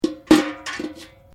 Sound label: Bucket Metal Put Down, Common bucket; Materials